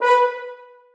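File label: horns staccato a#3; Sonatina Symphonic Orchestra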